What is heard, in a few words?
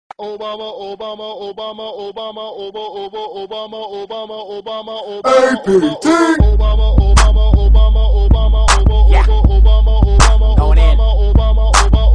Rap, ringtones; Obama